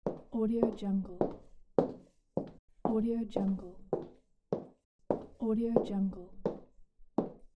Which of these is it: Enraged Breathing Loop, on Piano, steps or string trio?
steps